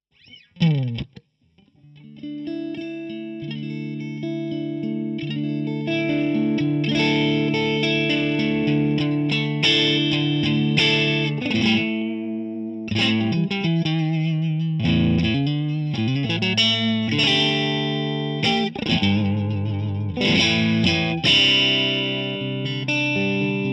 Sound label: Tele Vintage